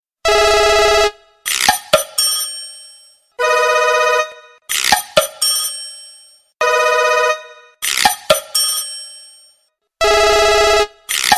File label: Galaxy S10 Ring Mix